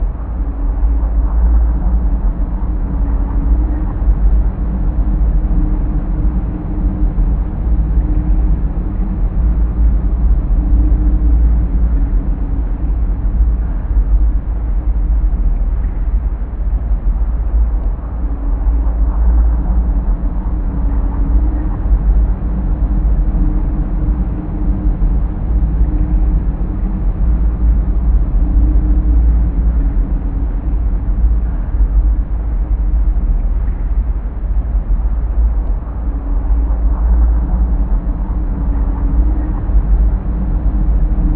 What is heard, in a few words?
drone; Rusted Factory